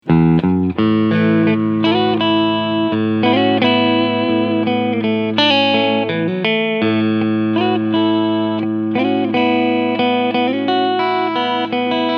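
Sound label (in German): AZS2209H Cleansound beide Pickups; Ibanez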